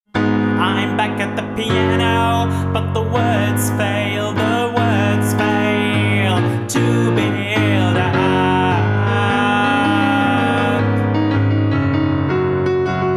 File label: piano song